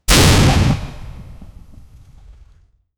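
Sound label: two quick lightning strikes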